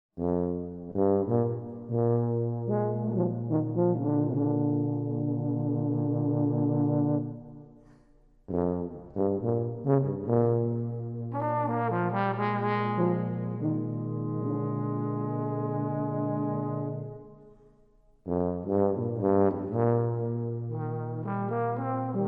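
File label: Slow, Free, Very Bluesy, Tubas; 12 Diversions - III.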